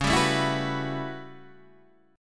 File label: A 13th C sharp, guitar chord